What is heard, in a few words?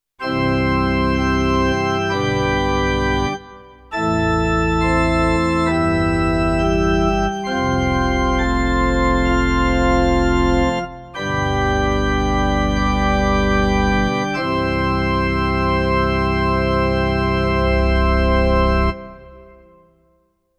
Yamaha P-225 Organ